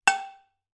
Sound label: impact metal dull pan